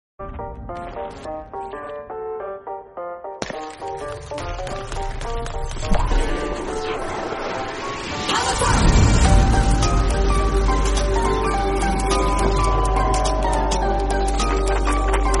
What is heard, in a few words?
Bgm; Pubg